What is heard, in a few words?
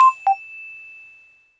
Ding, phonetones notifications; Yota Yotaphone-2